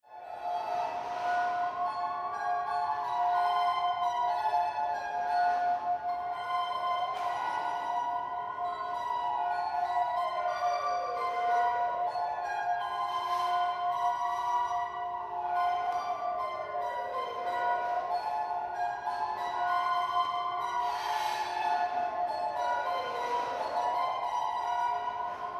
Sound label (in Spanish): Ciudad Mandolina Campanas Tren Sirena ambulancia